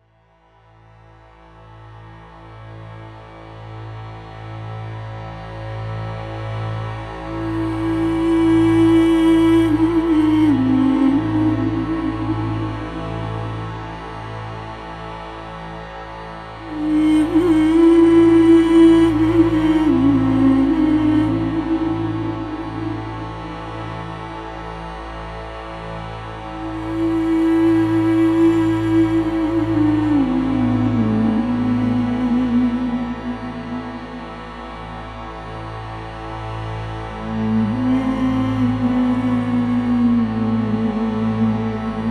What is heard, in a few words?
NEW AGE CINEMATIC VOCALS; CHROMA; ABBEY ROAD